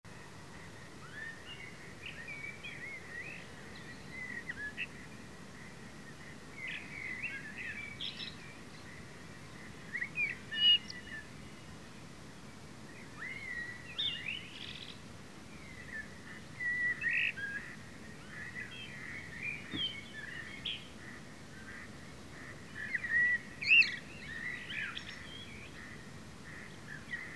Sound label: Turdus merula; SETE